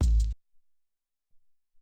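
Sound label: Hop Kick 4, Alesis SR-18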